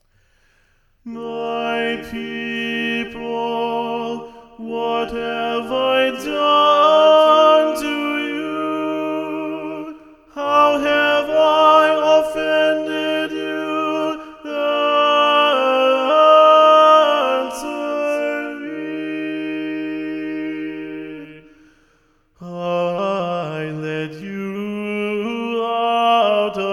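The Reproaches Part 1 SATB Tenor Predominant, Rehearsal Tracks; Tomas Luis de Victoria; ed. Geoffrey Cox; choirtracks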